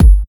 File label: Bassdrum